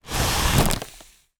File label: foley plastic bag blow air into inflate